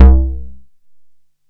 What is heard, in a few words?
synt, Juno-2; Giga Samples Collection; Bass